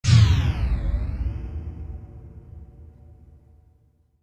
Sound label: Power Down